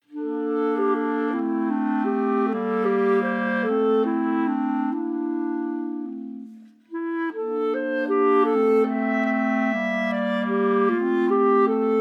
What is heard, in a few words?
3 Bb Clarinets